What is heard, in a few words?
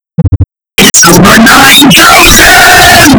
high volume